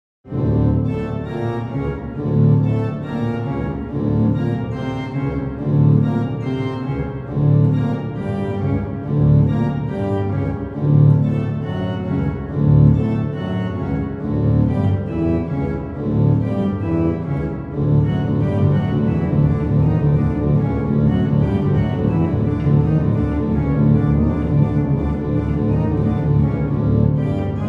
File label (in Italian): organo e pianoforte